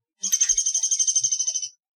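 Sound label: Downy Woodpecker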